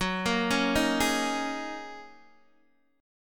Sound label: F sharp 6th Add 9th F sharp Guitar DADGAD